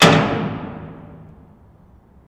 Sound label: Jail Door Lever Sing; jail & prison doors